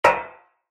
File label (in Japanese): 足音 金属 単音 一歩目; 足音の効果音